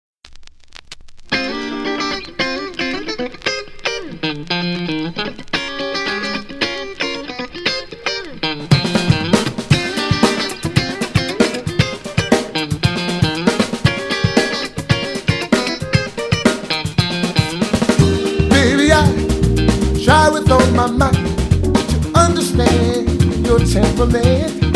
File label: Pocket Funk Confusion